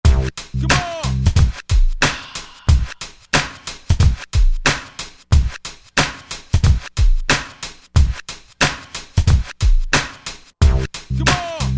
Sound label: Hop Chop; Roland SR-JV80-12 Hip Hop Collection